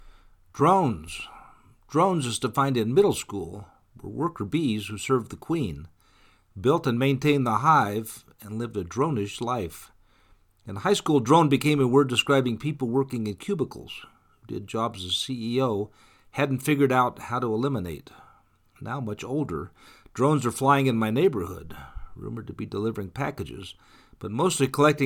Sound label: drones; Search and Rescue Sikorski Utility Helicopter